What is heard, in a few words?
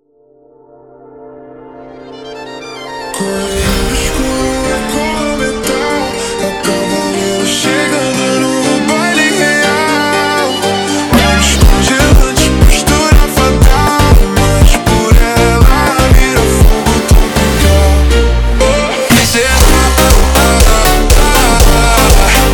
Montagem slowed